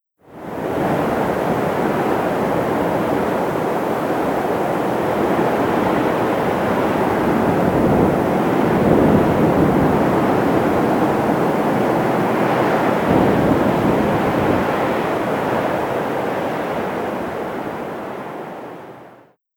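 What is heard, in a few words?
Cities Las Vegas Wind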